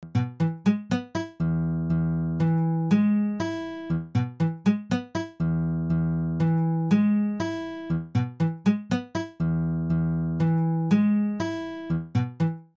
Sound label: stacatto; duration and timing